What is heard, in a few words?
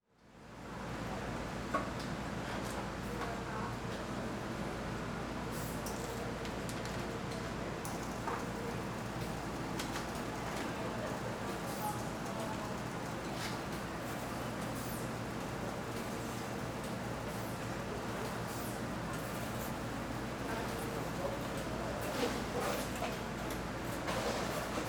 Ambience Theater Concession; AdobeCC